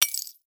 Crash Glass Break; crashes; AdobeCC

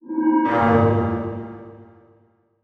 Transition Bell Swell; Adobe; Production Element

